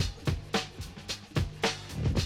110, Classic Drum Loops

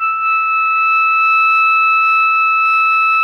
SOP PP E 5, Saxophones; Greytsounds; Philip Wolfe David Crozier's Saxophones

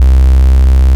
Bass Note C

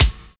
HORSE BEA; Univers Sons

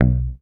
HOFNER MUTE, Bass

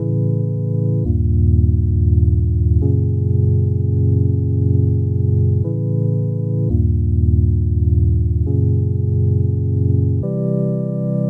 Organ, Drum & Bass Keys; Sonic Foundry (Sony Creative Software); Knutrix Drum & Bass

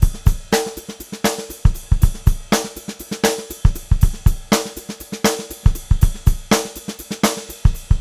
FUNKY, Funk Groove Drumloops; USB Soundscan vol.38; AKAI; 90 s Sample CDs; 120 FUNKY

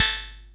Electro Percussion, House; Drums

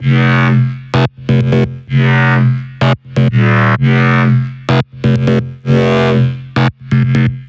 128 Fm Bass Freek, Melodic Loops; Electronisounds

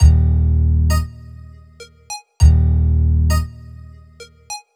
SYNT, funk; USB Soundscan vol.47; AKAI; Hip-Hop & R&B

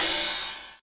Rides, Cymbals

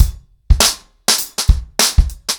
100BPM, ACOUSTIC DRUMS

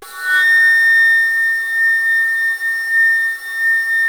ALTO A#5, ALTO FLUTE; USB Soundscan vol.23

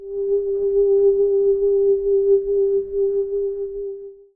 wind hose

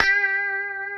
G 4B, COMPR STRAT, Guitars; Spectrasonics; Hans Zimmer Guitars VOL-1

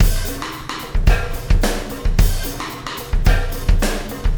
Wormhole Beat, Processed Drumkits

